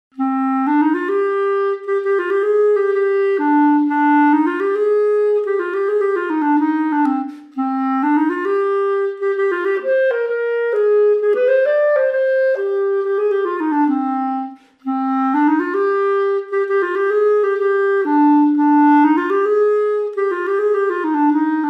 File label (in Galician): clarinete